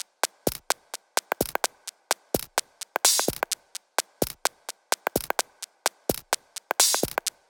128BPM Kit; VFH1 128BPM Roboto Kit

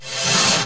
Sweeps Short

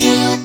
Synth Shot; Vengeance Samples

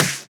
Claps & Snares

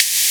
Snare; Vengeance Samples

